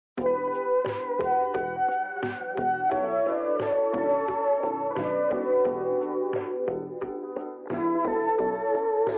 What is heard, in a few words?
vaporwave; meme